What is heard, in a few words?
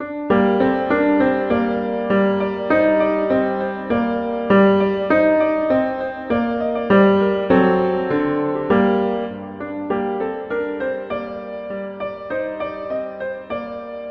Ancient French Song; Tchaikovsky